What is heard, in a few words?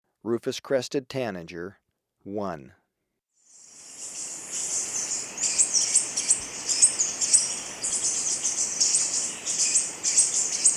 Rufous-Crested Tanager, Birds of Ecuador; S. America